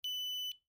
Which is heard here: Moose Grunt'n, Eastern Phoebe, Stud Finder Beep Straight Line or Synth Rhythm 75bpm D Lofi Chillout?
Stud Finder Beep Straight Line